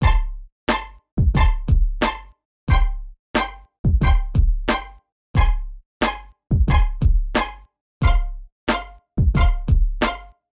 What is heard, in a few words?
Beat Pizzi